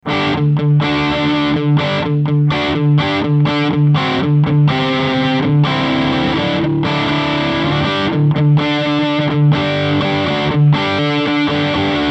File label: mxr super badass distortion medium gain bass3 mid10 hi1